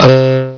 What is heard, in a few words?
Droid; Bip Boing & autres